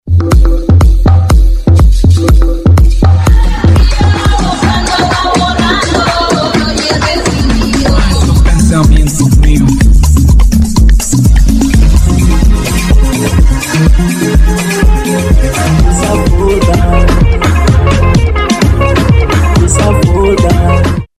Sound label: afro-latino